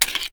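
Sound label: pistol 1911 reload empty mag out; gmod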